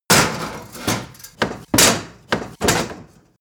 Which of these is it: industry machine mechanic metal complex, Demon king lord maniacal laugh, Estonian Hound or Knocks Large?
industry machine mechanic metal complex